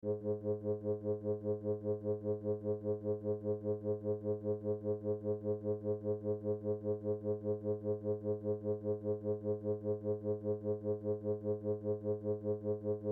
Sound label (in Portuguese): amostra de pulsos com periodicidade